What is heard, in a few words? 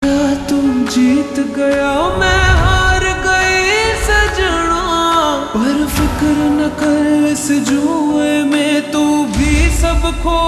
Ringtone Slowed Reverb